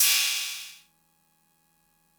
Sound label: cymbal; MFB-522